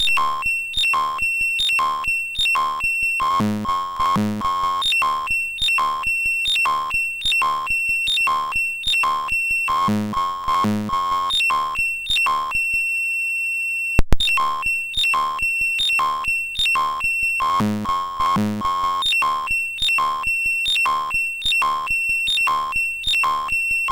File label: Sync Bass Line, MOS6581R3